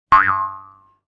Cartoon boing; boing sound effects

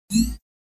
Hi tech toggle button interface

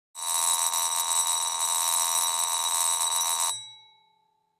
Electrical 80s doorbell ringing

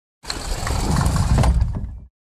Metal sliding across concrete metal rail

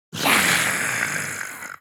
Animal creature monster attack

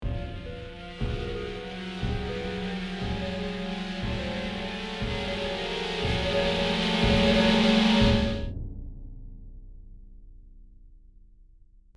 Horror ambience tension build up suspense

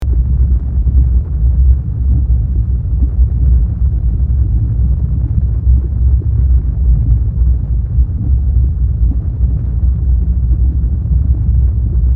Strong volcanic tremor ambience loop

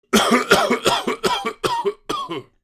Senior male severe dry cough

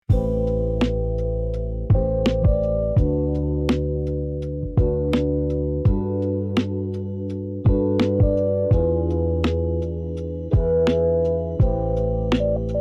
Chill Lo Fi relaxing drizzle and pages

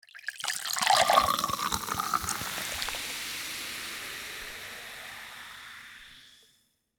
Fizzing soda pour bubbles cola; liquid

